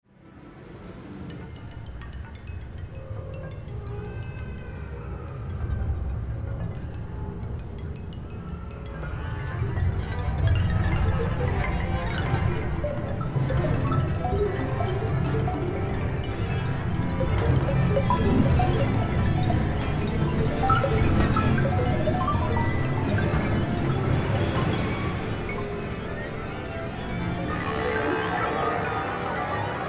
sparkler

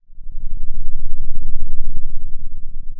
Sound of a thunderstorm, center

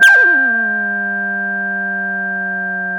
Sound of a school bell

